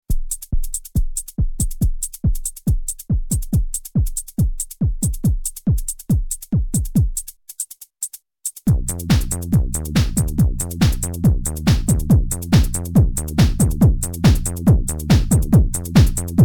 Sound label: Kick Dance; KickLancet